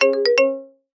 multimedia alert notification musical short marimba completed finished; zapsplat